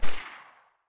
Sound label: silencer p220 mono far, weapons